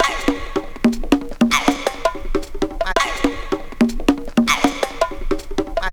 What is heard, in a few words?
Loops, breaks; M8